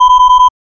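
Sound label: notification error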